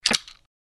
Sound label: platform sound button; slotssister